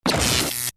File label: shock